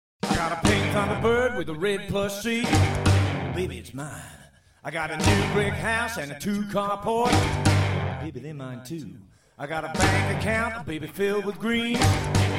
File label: rockabilly